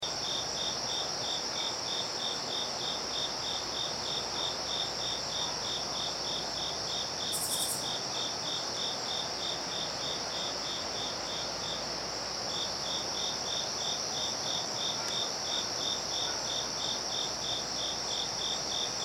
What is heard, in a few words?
japanese burrowing cricket; thomas wilson